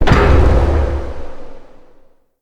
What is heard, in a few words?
Pull Beam Destroy; Encounters; Collector